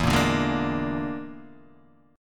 E 7th Flat 5th E down Guitar DADGAD

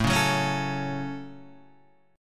A 6th, Guitar, DADGAD

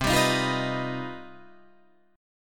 C Minor Major 11th C down Guitar Drop D

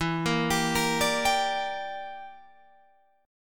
E Minor 7th Flat 5th E, Guitar Open G

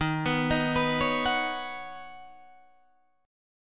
Eb Minor 7th Sharp 5th, Guitar DADGAD

